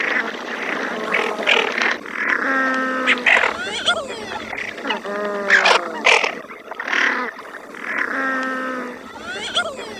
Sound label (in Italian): MORIGLIONE MORETTA